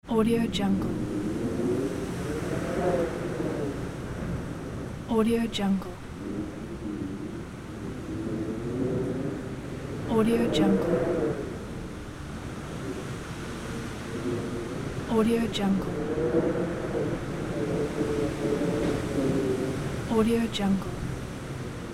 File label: whistling wind through door crack; ed-soundmaker